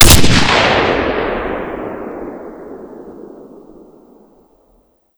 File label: famas; Modern Warfare